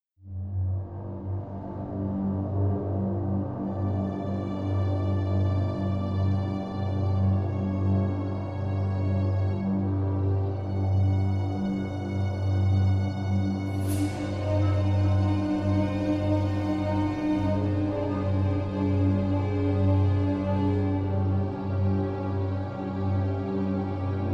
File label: Suspense Drama Production Music